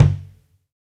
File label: Kick, Live Kit; neuro; Stanza